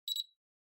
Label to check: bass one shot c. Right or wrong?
Wrong